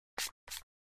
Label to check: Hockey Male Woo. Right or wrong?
Wrong